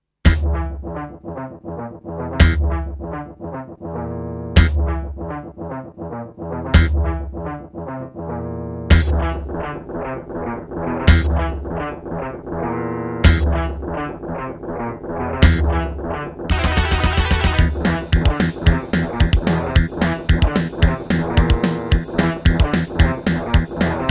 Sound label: Doepfer GMX-1; Doom